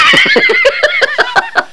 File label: LAUGH